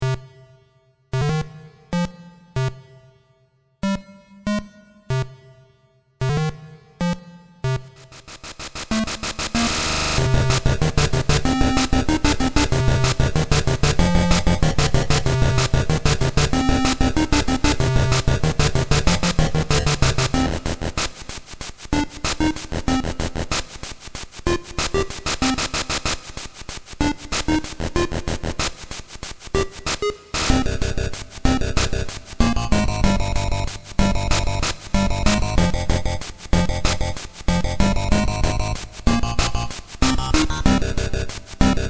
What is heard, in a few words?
8bit